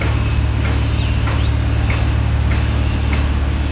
refinery loop, machines

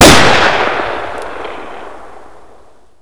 awp fire; cstrike; ZP CRISTIAN; ZPB WEAPONS

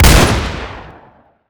xm1014; ZP CRISTIAN; ZP WEAPONS; bio I; cstrike

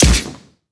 denied

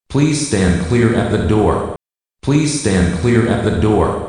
airlock alarms; ze space station pg

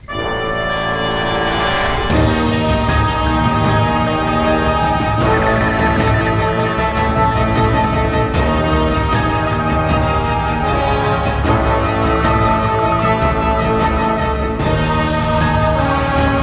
Music Rescue; bog nomercy

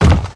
land, car

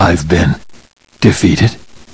defeat; seph; ze ffvii cosmo canyon v5r

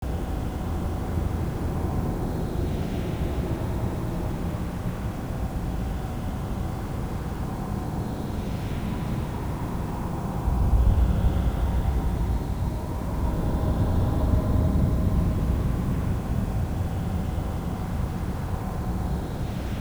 ambiente, sanatorium

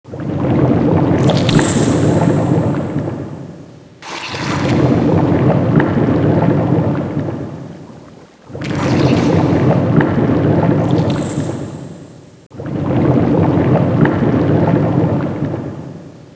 Cocoon Idle; Scenario; Npc; cstrike

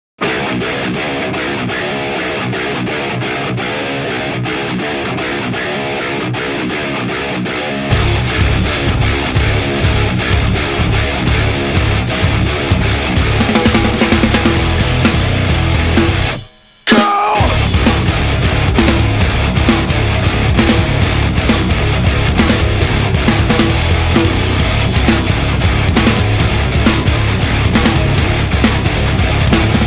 music; jb nuke csbr

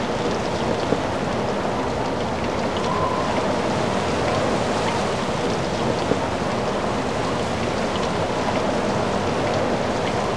rain interior glass

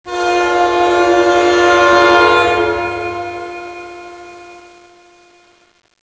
train env; havana

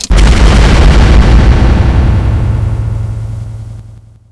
bazooka; zombie plague

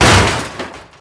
bump soft, car

